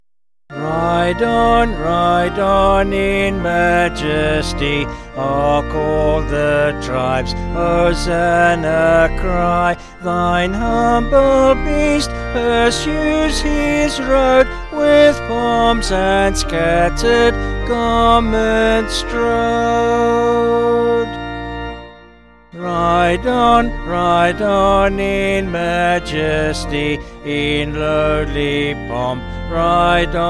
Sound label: Ride On Ride On In Majesty, Winchester New